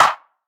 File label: subtle reverb hand clap sound E key